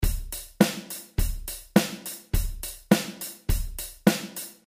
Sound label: slow rock drum basic 104 bpm